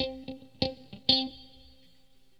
GUITAR, ROCK POP; MAGIX

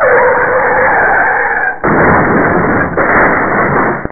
car skid, effects

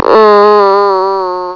AWW

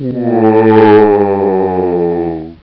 WOO

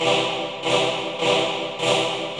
KEYB; MAGIX

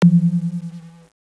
HOLLOW

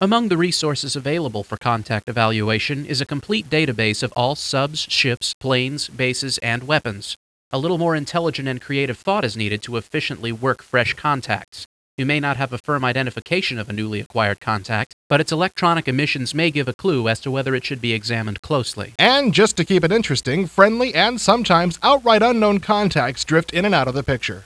HARP

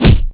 PUNCH

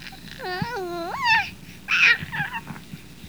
BABY

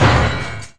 HIT; NIHILIST

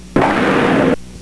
DOOR OPEN

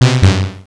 BURP; CYBERSPD

